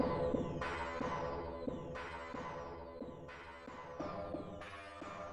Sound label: Synth FX, E min